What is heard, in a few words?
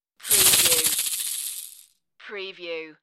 Caxixi